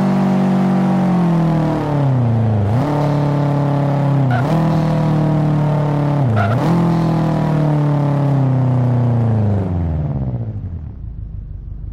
subaru impreza slowdown high; vehicles

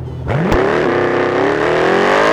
first, vehicles; charger 2015

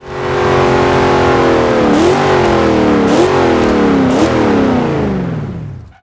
throttle off, vehicles; chevelless

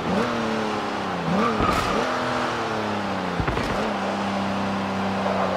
throttle off, vehicles; rr phantom drophead coupe

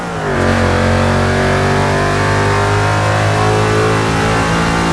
third, vehicles; gmod; tdmcars; gt05

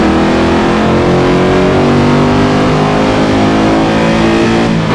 third, vehicles; gmod; tdmcars; ml63

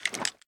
reload fast empty up; gmod; pi mike

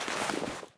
movement, weapons; gmod; csgo

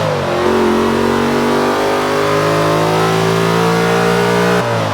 third, vehicles

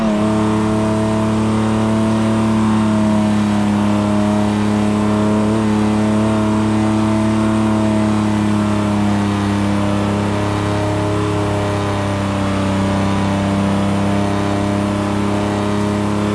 fourth cruise, vehicles; gmod; tdmcars; pon fiero gt

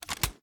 sm uzulu reload empty scope fast start; reloads; iw8; gmod

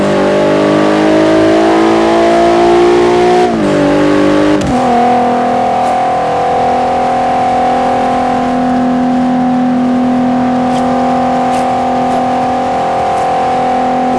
fourth cruise, vehicles; gmod; tdmcars; gtr

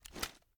first raise end; gmod

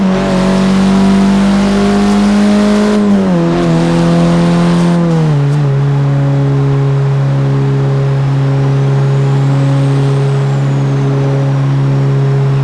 fourth cruise, vehicles; gmod; tdmcars; for focus rs 16

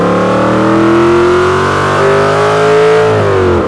second, vehicles; gmod; tdmcars; grand che

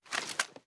reload grab mag, reloads; iw8; gmod

